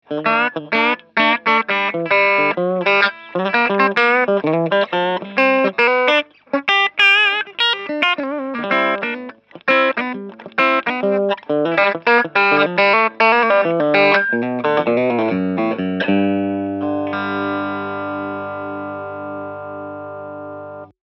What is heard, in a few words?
esp steg clean